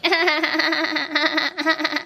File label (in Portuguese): risada forcada